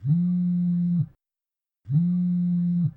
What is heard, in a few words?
vibrate